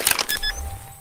planting c4; csgo

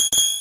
teleport; sonic.exe

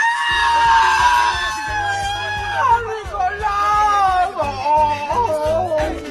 aahhhhhhh; ricolas

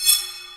ambient metal whoosh

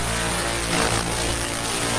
jumpscare; baldi

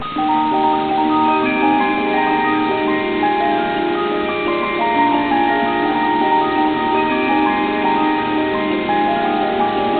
music box; fnaf 2

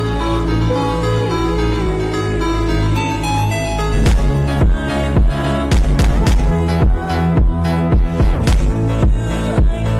phonk; salesman squid game edit

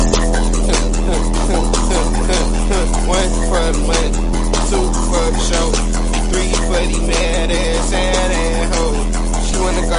bass boosted

